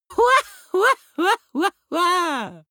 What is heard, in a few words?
laughter prig; vocals; comedy-cartoon; soundpool